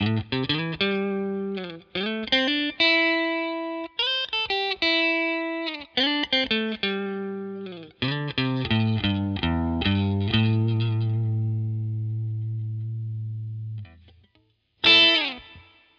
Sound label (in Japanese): センター フル; オレンジドロップ